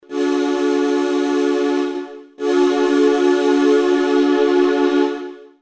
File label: xp chorus, Roland JV880; chorus: 3 presets of choruses/flanger with variable rate and depth